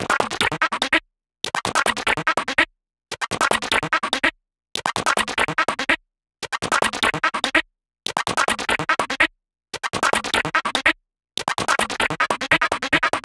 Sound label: Loop, PsyTrance